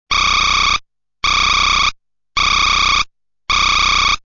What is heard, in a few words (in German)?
ziegenmelker, Vögel; midi; Polyfonisk musik; siemens